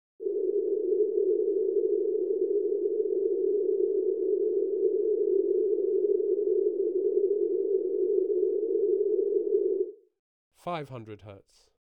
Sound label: 1,3 octave band, 400 Hz